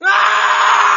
prop scream

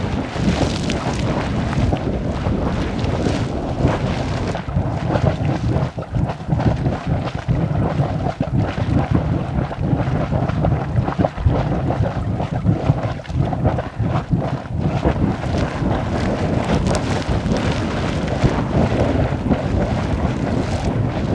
ambience water

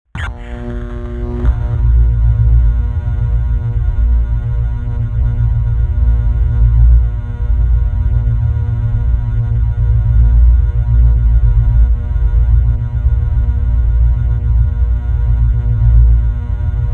electric spark powerup

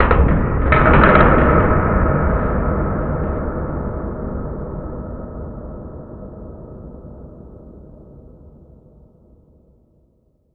big hit far

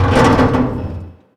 metal creak

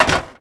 pickup heavy

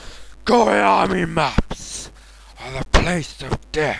death

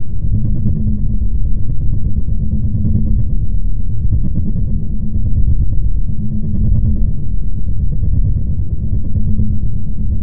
ambience

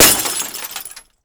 glass break

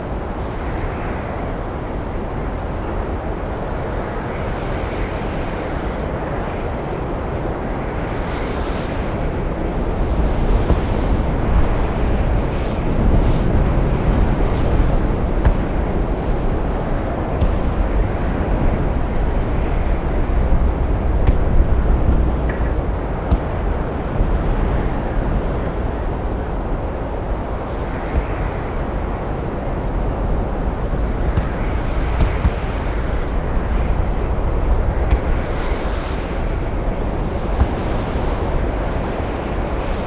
ambience outdoor battle

